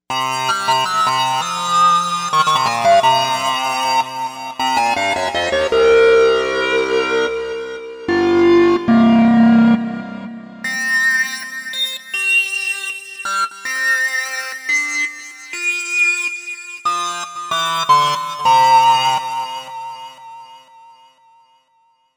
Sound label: Pro Sync, Korg M1Rex; Factory Patches